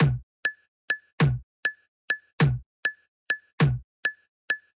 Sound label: 100 quirky latin bobble, drum loops and breaks; 091 to 100 bpm